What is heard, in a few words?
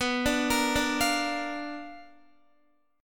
B Minor Major 7th Flat 5th Guitar; BmM7b5; arpeggiated